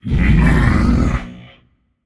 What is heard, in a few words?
tongue, voicepack; smite; jorm